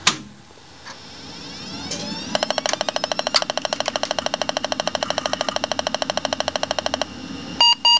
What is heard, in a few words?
BOOT KEYB; 999wavs